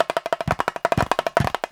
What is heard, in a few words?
LOOP Perc Mono